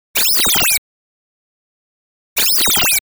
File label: Enemy Shot